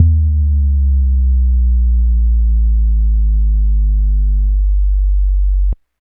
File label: Bass, Breakbeat